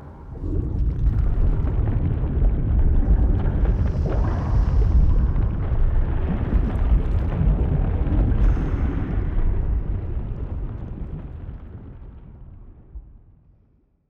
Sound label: magma cavern; rifts; Stellaris; astral planes